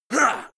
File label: male fight short; menschen; schreie